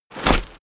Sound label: step soldier infantry step